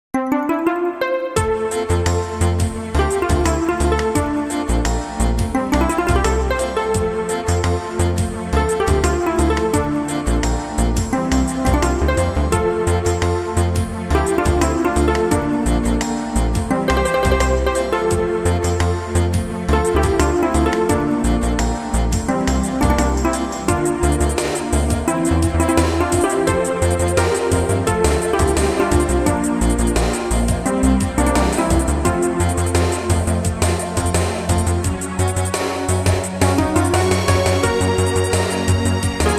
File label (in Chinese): 纯音乐背景音乐